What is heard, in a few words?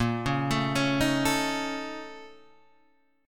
A sharp Minor Major 7th Double Flat 5th A sharp; A#mM7bb5 Chord {6 4 7 6 4 5} arpeggiated